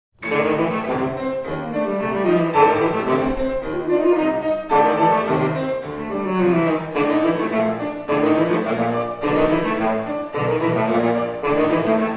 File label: Sax and sex